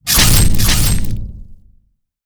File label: rage blow